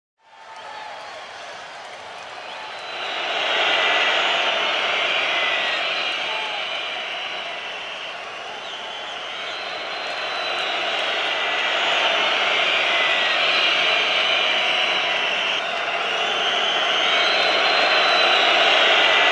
fon tolpy s reakcijami

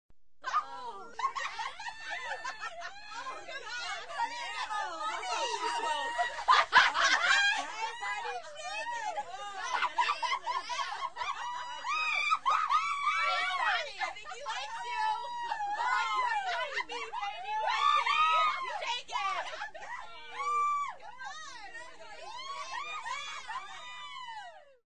zhenskaja pjanaia kompania; Звук смеха

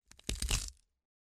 hrust slomannoj shei

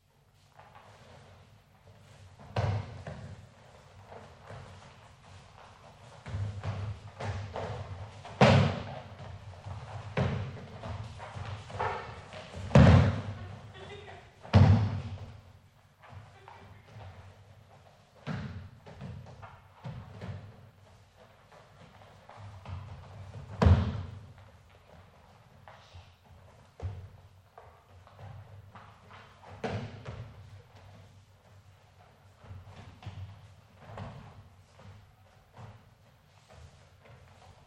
3 cheloveka v uprazhnenii

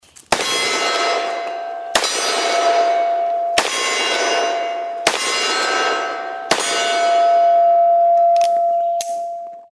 vystrely kolt 45 po metallicheskim celjam

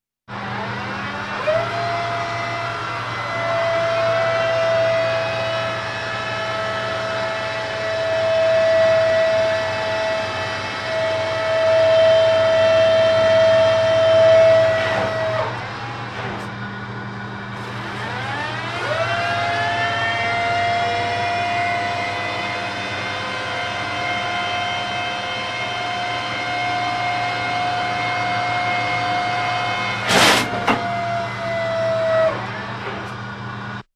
Tank bashnia povorot vnutri; Поворот башни танка — звук внутри

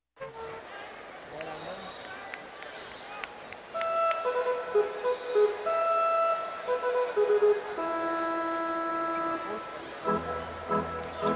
sirena podderzhki

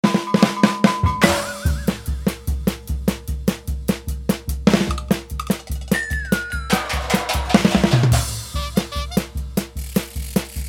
barabany ritm s komichnymi

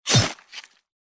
zvuk otrubanija; Звук мечей; Звуки сражений на мечах, звук ударов меча

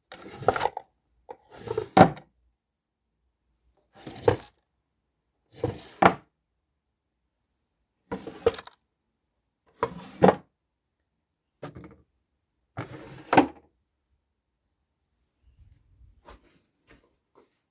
kroshechnye jashhichki v telefonnyh stolikah i podstavkah pod dekor

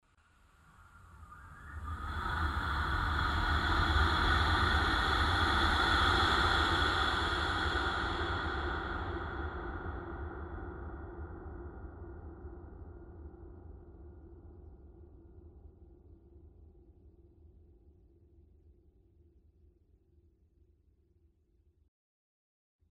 prizrak prohodit skvoz stenu

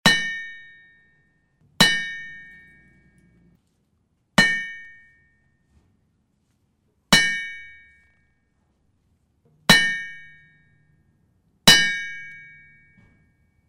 po nakovalne bolshim molotkom

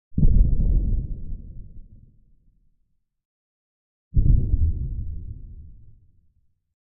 Dva vzryva pod vodoy; Звук взрыва от бомбы или снаряда

